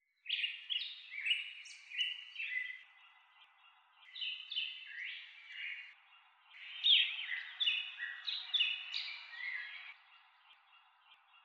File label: Relaxing Sounds; Picross S6